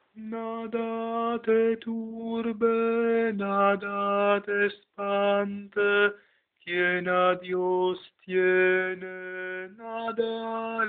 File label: tenor